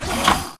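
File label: Stalker Movement Servo Damaged Walk; Titanfall 2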